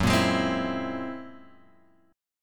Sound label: F sharp 9th Flat 5th F sharp Guitar Open G